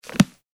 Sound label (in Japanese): 本を閉じる